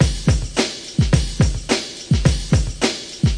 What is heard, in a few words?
bum chakka boom, drum loops and breaks, 107 bpm; 101 to 110 bpm